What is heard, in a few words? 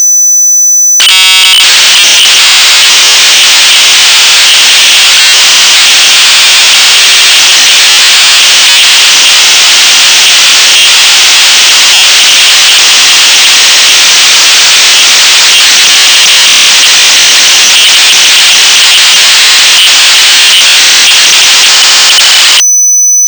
panharmonium boot